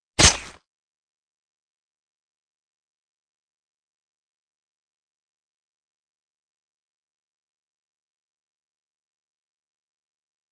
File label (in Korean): Fights, 싸움-전투; 헐리웃특수효과음